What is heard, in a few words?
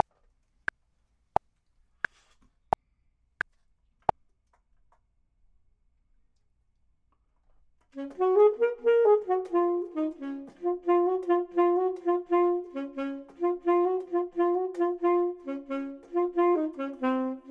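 bottom, Saxophone and Clarinet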